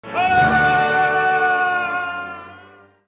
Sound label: screaming opera singer